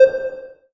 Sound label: Beep Ping